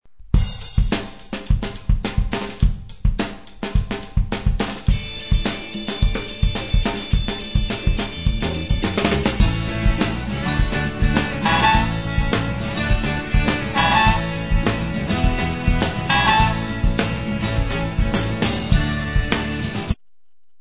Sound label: Catchy Tune